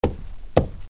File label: DBL BONK